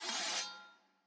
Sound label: ambience room polygon cut training